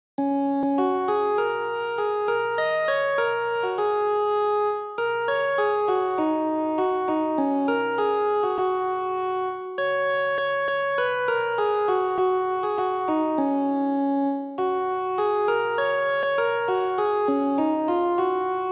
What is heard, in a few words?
organ